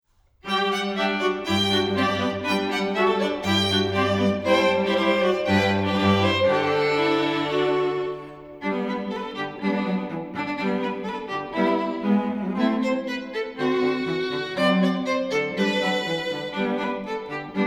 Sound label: Jingle Bells, strings; Charlotte Strings for Events